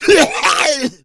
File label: smoker pain, zombie; Left 4 Fortress